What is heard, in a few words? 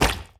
bounce small; fistula